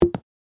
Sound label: click normal; powerball evo